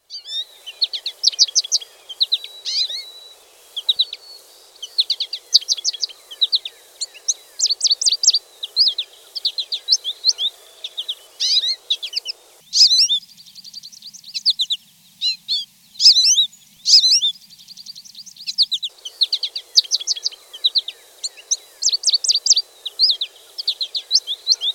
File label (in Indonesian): suara american goldfinch